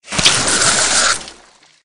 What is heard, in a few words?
squirt neon water gun